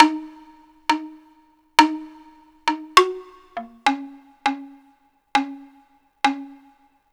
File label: Boo Melody, Ambient Melodics; Ambient Atmospheres & Rhythms; Ambient-Melodics-I